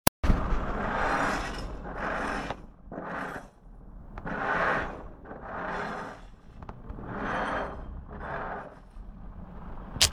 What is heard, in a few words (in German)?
klirren